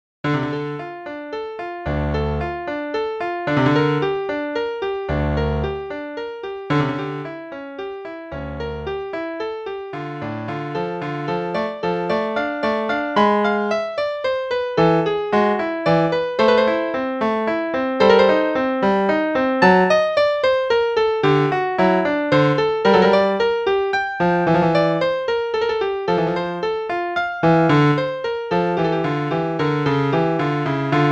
Play-a-long Little Prelude in D minor, qn=112, piano; Bach